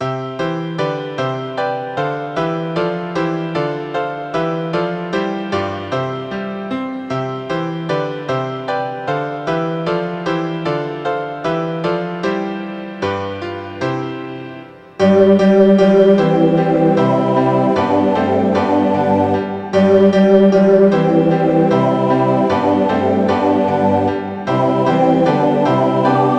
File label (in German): kein schoener land ttbb klavierpartitur